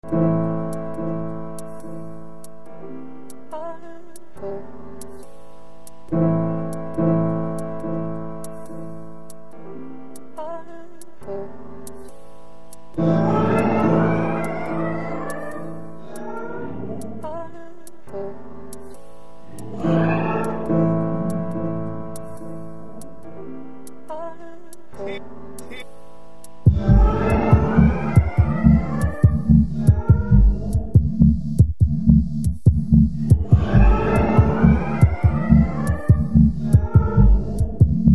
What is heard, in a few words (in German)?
klavierwerke